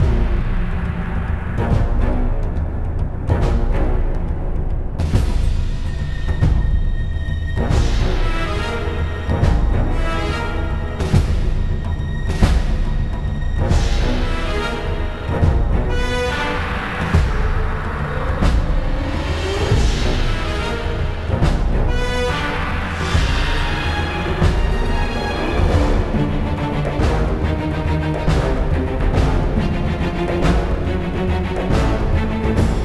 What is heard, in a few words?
Music; legend of the guardians the owls of ga hoole 2010 nds